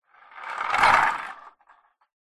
leisure toy truck plastic push roll pass by carpet floor